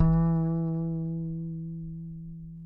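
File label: acoustic bass vibrato E 3 left; Masterbits CLIMAX 7 - Bass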